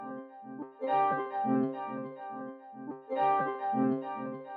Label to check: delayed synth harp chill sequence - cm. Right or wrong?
Right